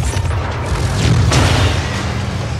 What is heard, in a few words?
Machines Breaking; HOTS